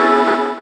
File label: DR. LOOP; X-Static Goldmine 3; CHORDS